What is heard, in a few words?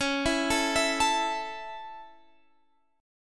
A Major Db